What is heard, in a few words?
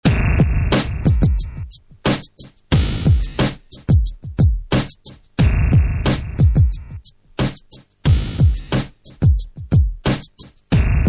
hardcore beat